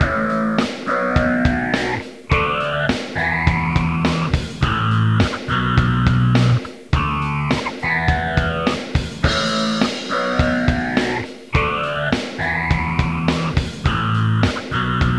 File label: Rock Style 2; 1:41 min.; RCT